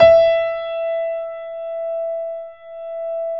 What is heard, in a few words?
E 4, Keyboards Acoustic, GRANDP ST; USB Soundscan vol.17